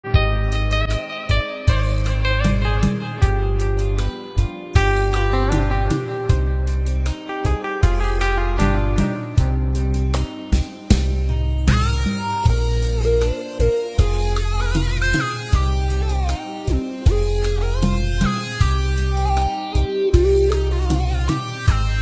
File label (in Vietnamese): Hòa Tấu Rumba Không Lời